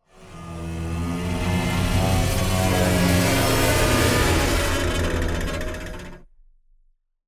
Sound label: Strings and wires dissonant bow stroke